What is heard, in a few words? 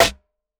SNARE ELECTRO